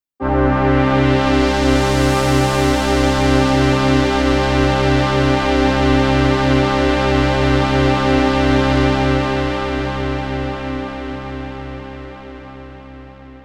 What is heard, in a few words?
OB-X8 OB-Xa8 12 dB 24 dB Pad